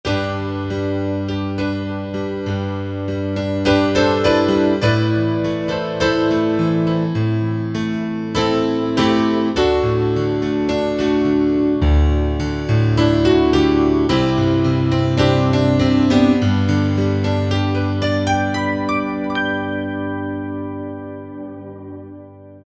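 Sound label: Piano For Ballads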